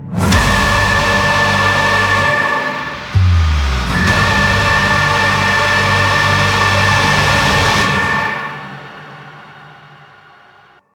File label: heavy transition; Doom